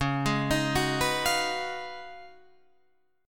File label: Db 11th Db, Guitar, Drop D